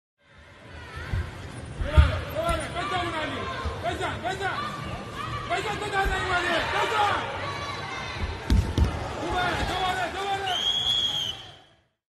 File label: wrestling match